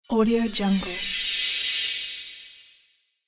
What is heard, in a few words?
magic shimmer spell